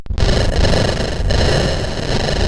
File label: Die Alien Slime, CSG8580R5